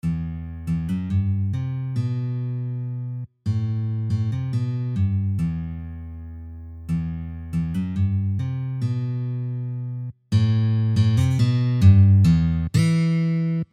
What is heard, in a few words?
riff; prostoj